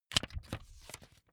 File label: magazine flip back fast